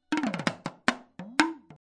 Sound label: tdrum, drums